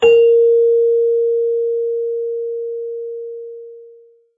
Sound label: chime